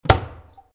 noisy impact

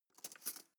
cutlery teaspoon drawer grab soft

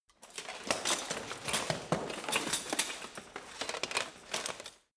get up

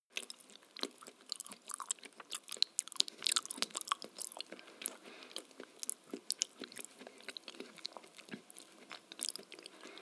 male eat smack

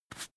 broom hand sweep short

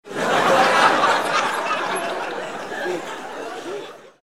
laugh

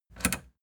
plastic button latch click enable fast

